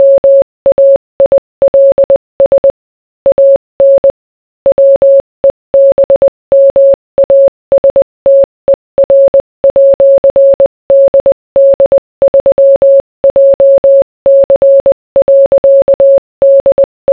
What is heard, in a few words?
morse